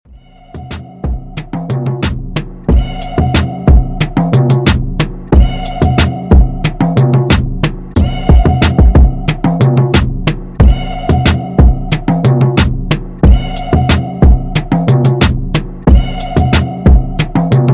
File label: Top40; Redrum